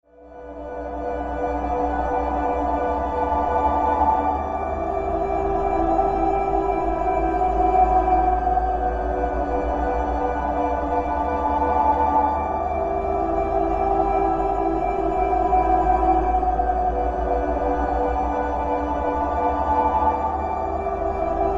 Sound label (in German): KELLERWIND; Musik - Beispiele